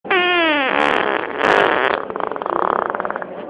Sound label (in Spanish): DE ARRIBA A ABAJO, pedo